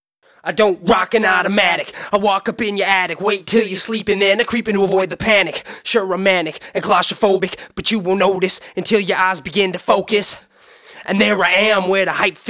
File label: acapella